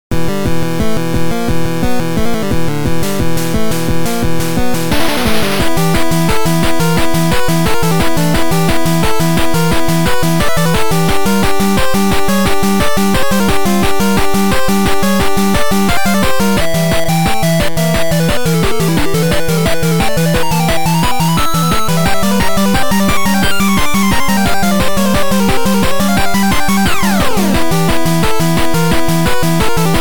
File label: 8bit boss